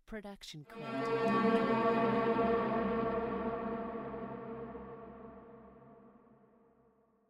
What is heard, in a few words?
Ominous Magic Drone; SoundsCrate